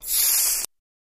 DC Arc Across Knife, arcs & sparks

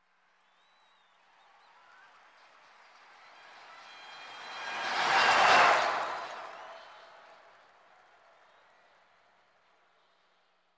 Arena Crowd Charge, sports arena

